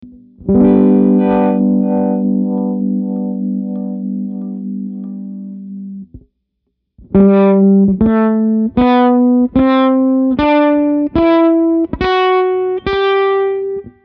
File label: Tone Swell